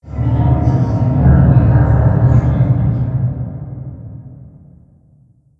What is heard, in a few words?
mall random, ambient